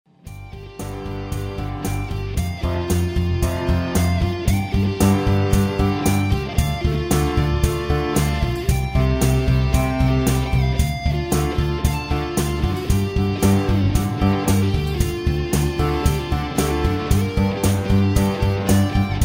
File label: guitar solo; songsmith; microsoft; infomercial